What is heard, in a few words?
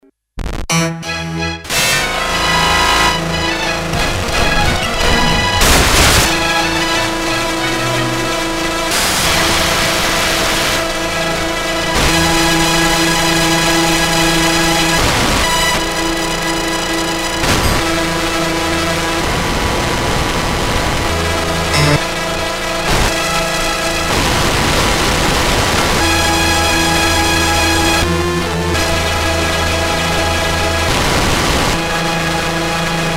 Circuit Bent Casio CTK-550